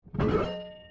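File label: flag capture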